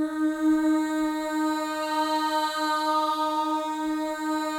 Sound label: MOVING V2, Symphony of Voices; Spectrasonics